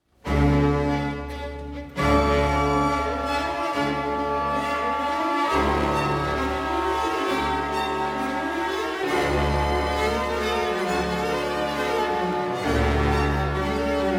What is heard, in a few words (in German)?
orchester solisten